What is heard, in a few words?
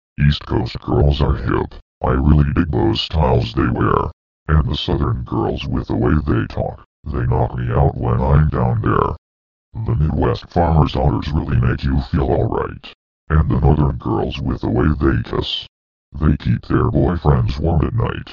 dance music ringtone